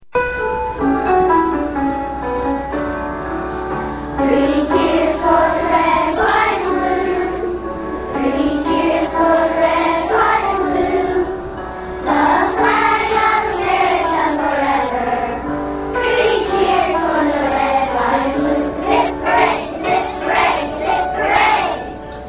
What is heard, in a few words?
cheers